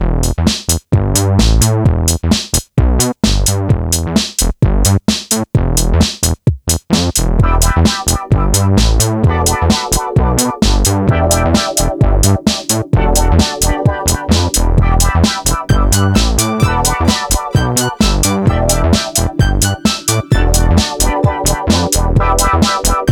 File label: test tal bassline